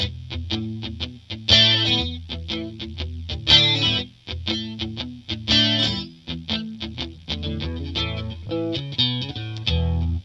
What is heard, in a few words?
mid sing, guitar